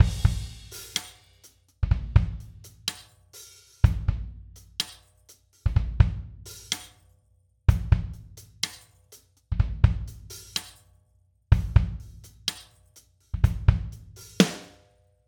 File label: Drums Groove 126 Soul Straight; Steven Slate Drums 5